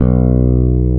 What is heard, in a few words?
FLS BS LOW, FRETLESS